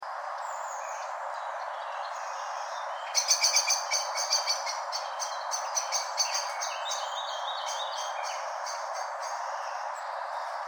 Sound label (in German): amsel schimpft